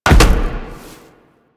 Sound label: Land Heavy Glass, Footsteps; Warframe; Tenno